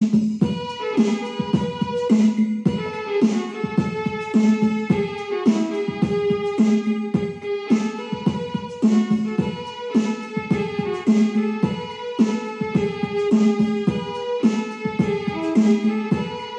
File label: cartoon bgm; chhota bheem